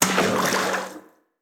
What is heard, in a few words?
Water Splash In Short; UltimateFishing